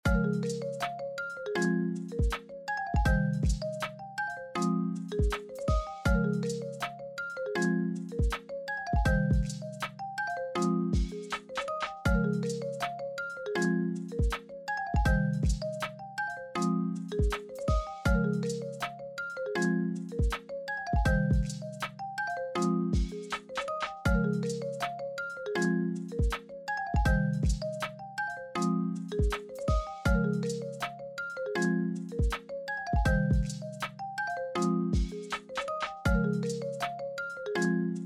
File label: ringtone